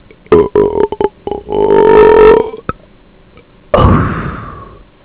burp; planethalflife; studiosound; outtakes

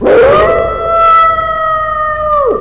wolf; quakerepo fortress misc

